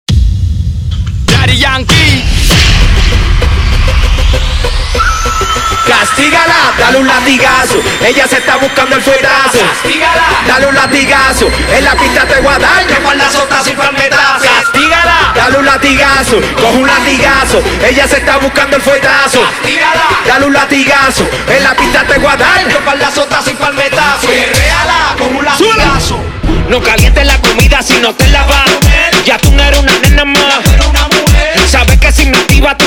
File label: Open Show 98 BPM